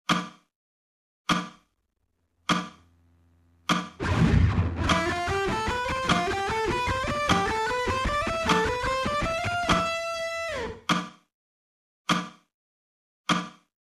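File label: alternate picking slow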